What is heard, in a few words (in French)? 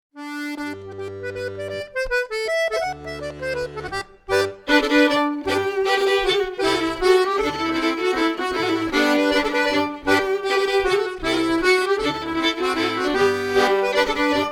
mazurka